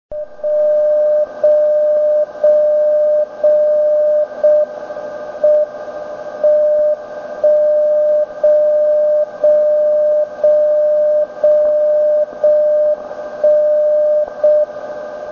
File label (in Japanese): 長波JJY受信例